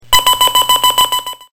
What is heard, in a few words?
pinger